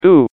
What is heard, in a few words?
pyro taunt rps exert